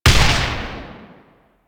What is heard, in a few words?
Board Sword Explosion; Sundial